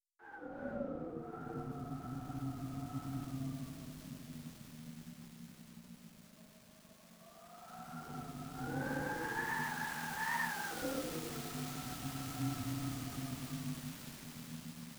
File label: wind